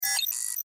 Mail arrive, Mail Arrive: Alert To Indicate New E-Mail; Chimes; Multimedia; Chime Sound Effects; Stuart Duffield